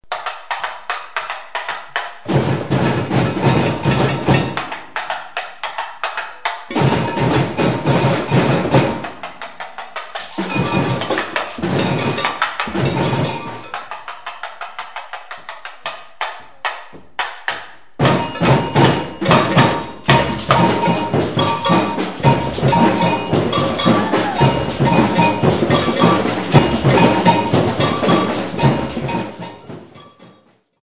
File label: Samba band recording; samba drumming